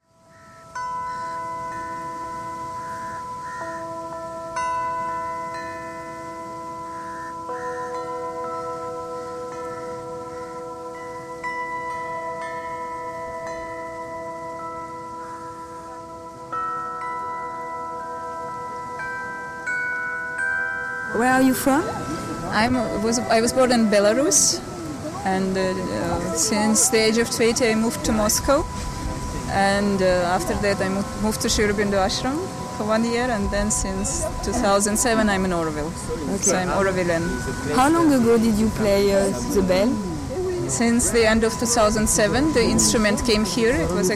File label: russian bells, music; vera at repos for full moon